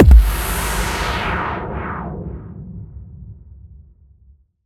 impact; Bluezone Corporation; Distant Wave - Cinematic Ambient Sounds

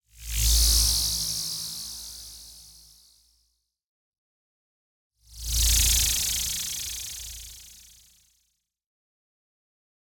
Resonant Electric Whoosh; Justsoundeffects; Futuristic Interface

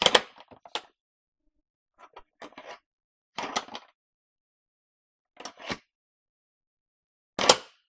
door lock; George Karagioules; The Source Collection SFX Pack

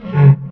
barnacle grunt; hl2

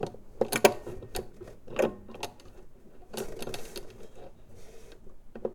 Mechanism Handling, Antique Clock Mechanism; Sonic Bat

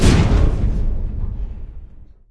horizontal stop, crane; hl2; doors

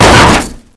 hit, metal; hl2; impact

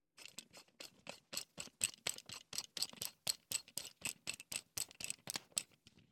Bar Clamp, Screw, Tighten; UberDuo; The Workshop Audio Playset

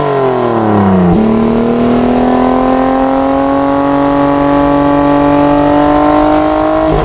atv third gear, vehicles; hl2

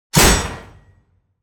IMPACT METAL Short Slam; SmartSoundFX; Impacts, Hits & Whooshes

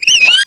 idle, headcrab poison; hl2

